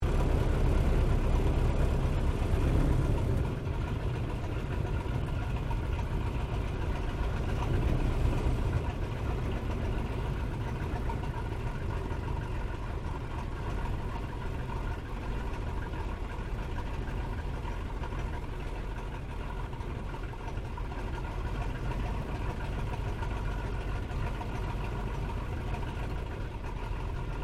AIRCRAFT SOPWITH PUP REPLICA TAXI SHUTDOWN INTERIOR; Airplanes; 1916; Replica of a 1916 Sopwith Pup